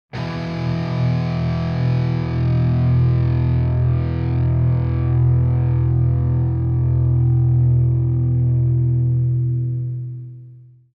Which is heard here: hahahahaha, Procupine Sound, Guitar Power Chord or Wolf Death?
Guitar Power Chord